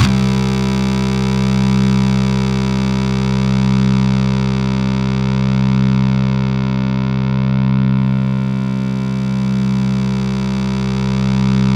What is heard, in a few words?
C2, Synth Line; 90 s Sample CDs; NiceBeats; SynthLine VOL-33